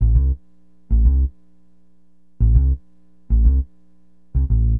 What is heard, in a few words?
BASS, Acid Jazz Funk